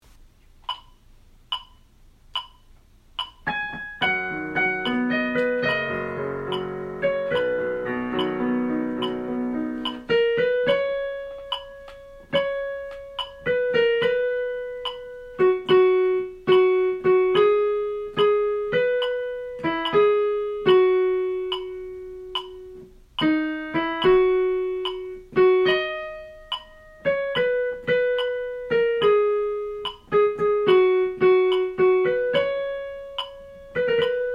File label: Glory Sunshine Soprano, Accompaniments